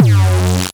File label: Acid Sounds F#, Synths; Vengeance Samples